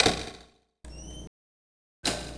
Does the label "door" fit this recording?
Yes